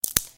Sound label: branch snap